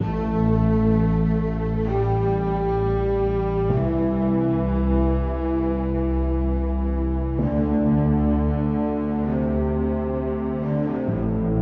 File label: Game Over Music; Royal Frontier